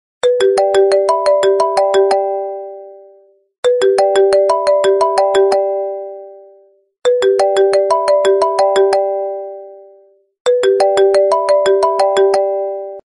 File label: iphone original